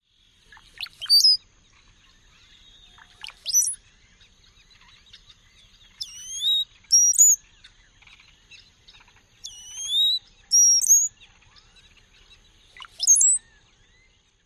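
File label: Bronzed Cowbird gurgling song and whistle call; TX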